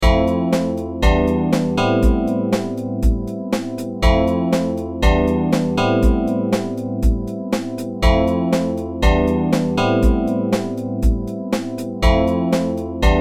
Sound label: Fmaj7 em am